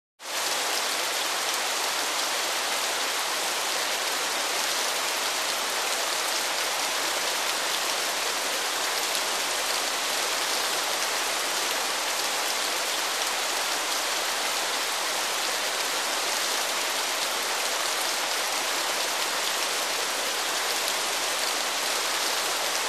Waterfall, Small 1; Rapid, With Big Splashy Drops, Close Perspective.